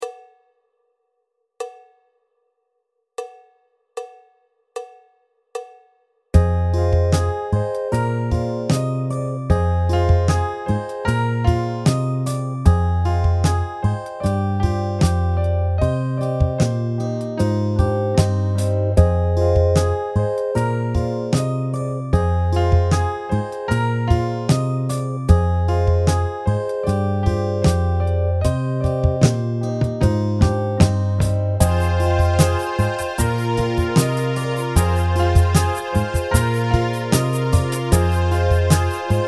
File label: Solo